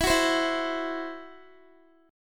E Suspended 2nd Sharp 5th, Guitar; Esus2#5; strummed; x,19,18,17,16,x

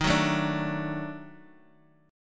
Eb Minor Major 7th Flat 5th, down

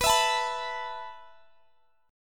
B 7th Suspended 4th B down Guitar Drop D

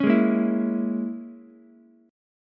A sharp Minor 7th Flat 5th A sharp, Ukulele; strummed

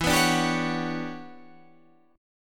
E Minor 13th guitar chord, Half Step Down

